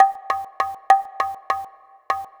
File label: Small Drum; Sonic Foundry (Sony Creative Software); Myths of Technology; Acoustic Beats